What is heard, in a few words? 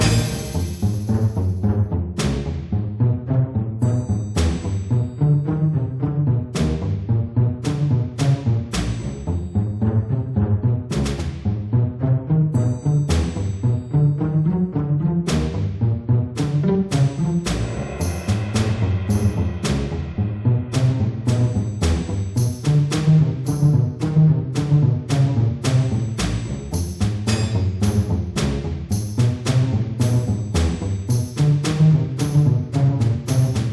Level music; Gatling Gears